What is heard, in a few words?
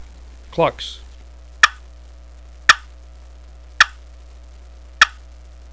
cluck, turkey; millennium